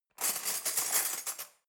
cutlery knives drawer handle

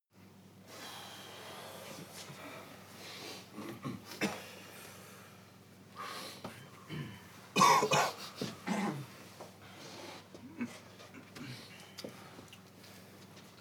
walla waiting

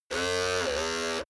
servo steady

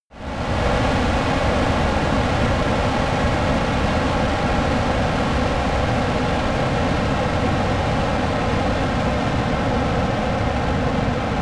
military jet flanker-c turbine slow down

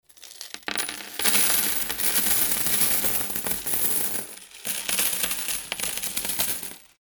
money noise

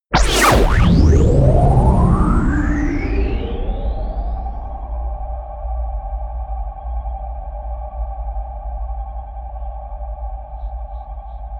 lazer bazooka

